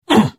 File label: Hit; The Elder Scrolls III - Morrowind